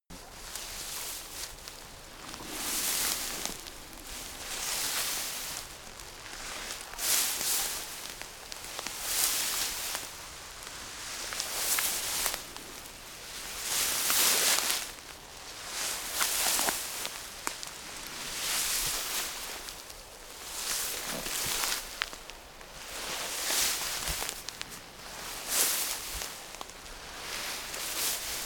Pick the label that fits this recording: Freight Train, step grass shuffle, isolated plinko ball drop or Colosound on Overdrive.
step grass shuffle